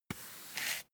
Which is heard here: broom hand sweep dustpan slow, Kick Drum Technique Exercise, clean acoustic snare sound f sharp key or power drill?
broom hand sweep dustpan slow